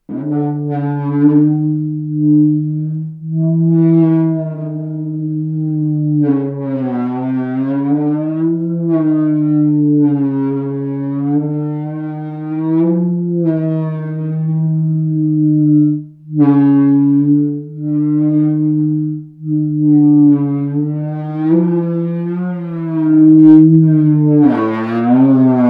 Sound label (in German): Rubber Mallet klein große Djembe